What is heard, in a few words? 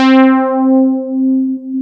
MKS80 BRASS; Rubber Chicken Software Sample Bank